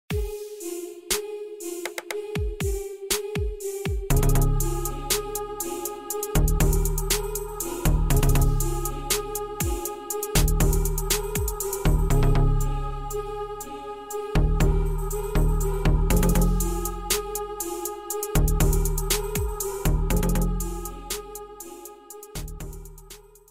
Trap Remix; Squid Game

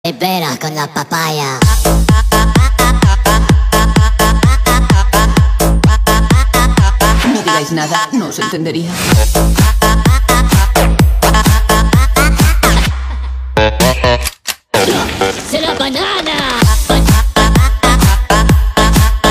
Minion Banana Techno

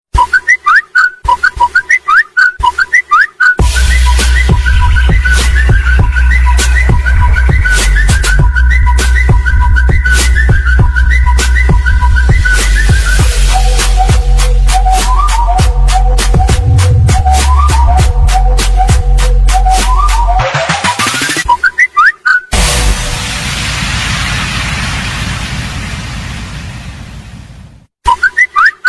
Samsung Galaxy M51 Original

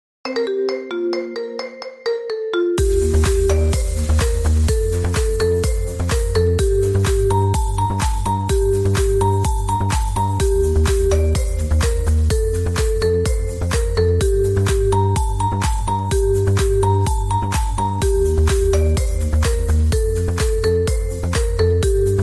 Marimba

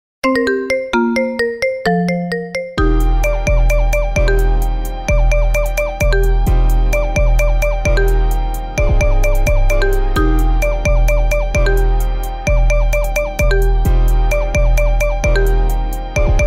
Marimba; TikTok

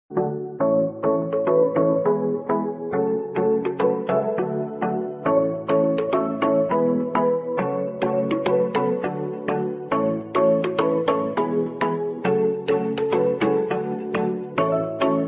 Realme 8 Pro Original

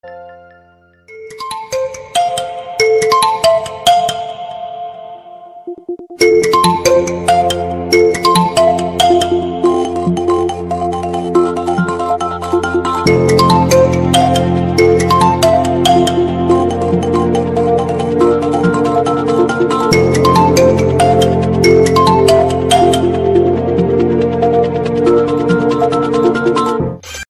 Oppo Find X3 NEO

